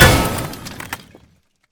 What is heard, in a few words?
metal collision